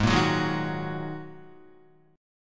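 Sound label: A flat 7th Suspended 2nd A flat Guitar, chord; Ab7sus2 strummed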